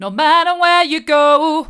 hard, vocal

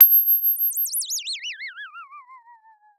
Sound of a bird tweeting nicely

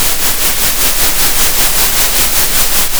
center, Sound of a train

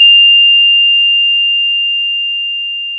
pop, Sound of a school bell

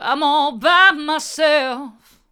hard, vocal

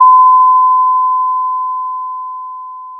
Sound of a doorbell

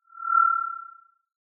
Sound of an owl

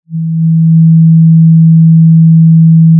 pop, Sound of a cruise ship horn

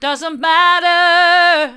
doesn't matter hard, vocal

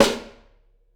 Piccolo D, drum

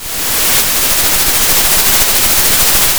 pop, Sound of a thunderstorm

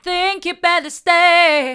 think you better stay hard, vocal

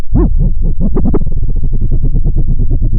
Sound of a mud pump

